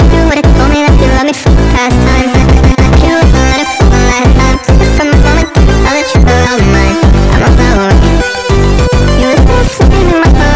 beat + voc

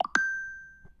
pixel notif

